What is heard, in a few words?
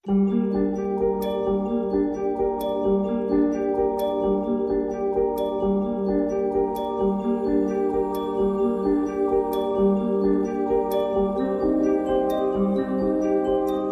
Handbells G